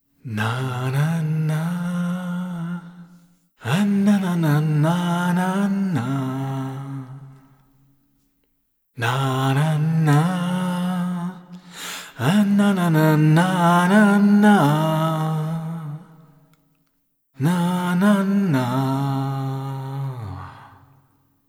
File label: Vocals adlips FX Niere FET; Lewitt LCT 1040